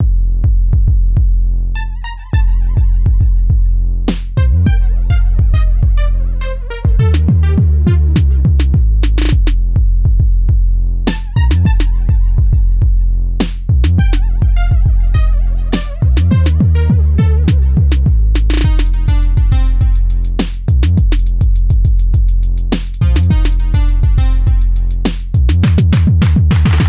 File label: 808 major 9